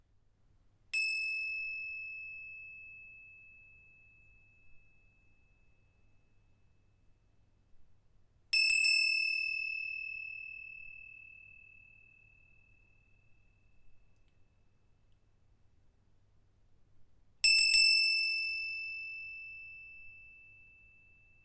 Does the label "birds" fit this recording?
No